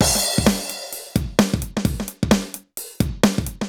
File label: Alesis HR-16B (130BPM), beats; musicradar; cyborg beats